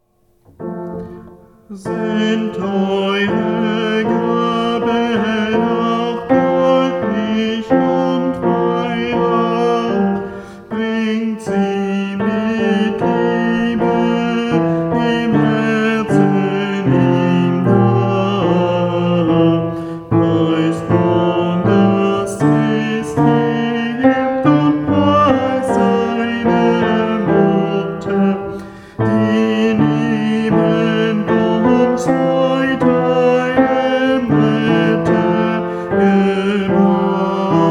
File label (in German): Wiegenlied Tenor, adventsmusik tenor